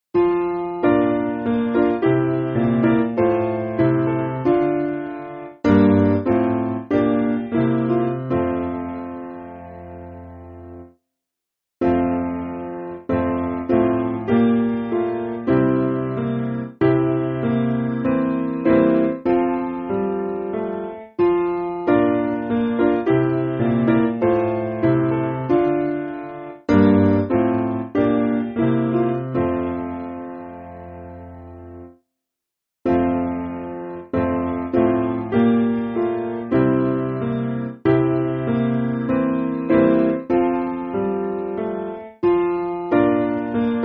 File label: Piano, Free Music Backing Files for Churches